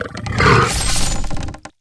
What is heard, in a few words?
attack